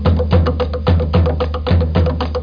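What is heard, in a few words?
DRUM CHANT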